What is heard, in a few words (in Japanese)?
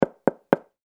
ドアノック